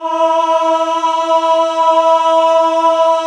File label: MALE E3, choir